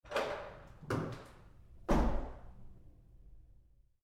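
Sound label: Door Bathroom Stall Open Roomy Lite with Lock; Big Room Sound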